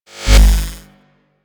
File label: UI Enter; DE; Warframe